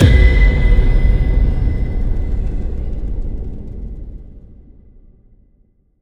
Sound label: win large win; rise of maya